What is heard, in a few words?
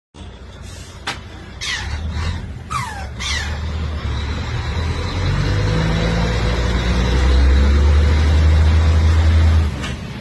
FM gear whistle; جیغ دنده یا سوت دنده